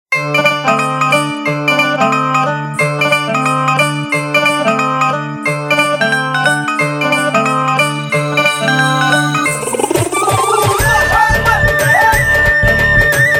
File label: Bhangra Beat; Nokia Ringtones; Lumia 830